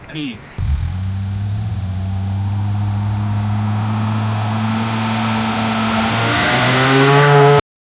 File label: the roaring sound of two, racing